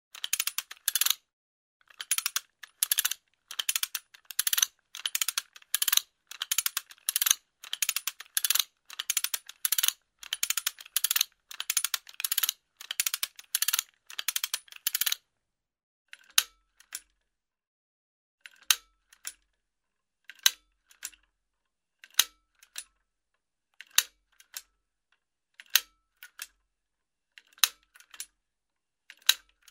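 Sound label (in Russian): шестеренки в лебедке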